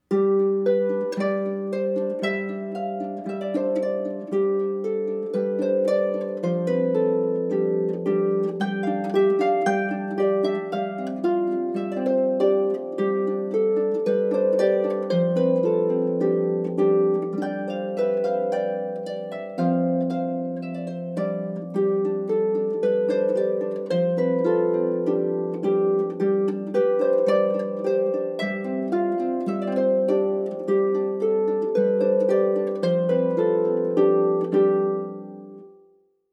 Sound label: Harp